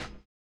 LO FI HH; LO-FI KIT; USB Soundscan vol.11; AKAI

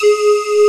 LINEAR SYNTH

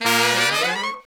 Horn Section Fall; Sonic Foundry (Sony Creative Software); Jazz Solos and Sections

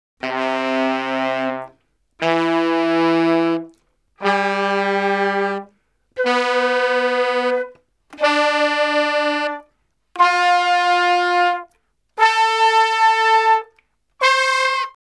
Salsa Picante; Masterbits; World Zone VOL-3

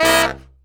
C BRASS; E-MU Formula 4000 Series Vol. 1; Hip Hop Nation; Booty Brass

